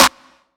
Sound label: clap; FL Studio; xander brown drumkit